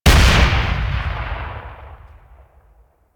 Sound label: Hek Shot; Warframe